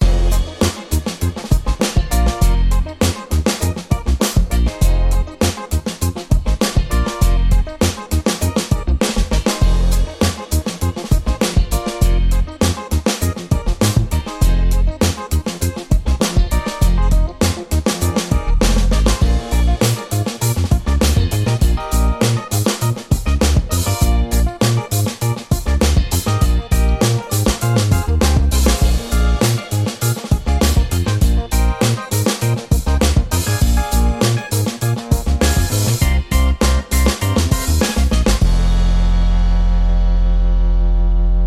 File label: Grooving Funk; MIUI12